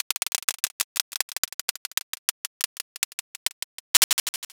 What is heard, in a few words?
roulette